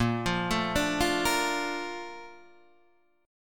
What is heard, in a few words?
A sharp Major 7th, Guitar Half Step Down